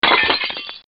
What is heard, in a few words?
Glass Shattering